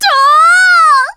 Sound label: Long Shout; shouting (long); Faux Hero; zelda